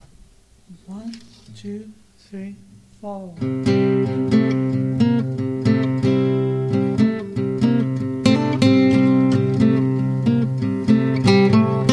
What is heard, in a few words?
LEAD BLU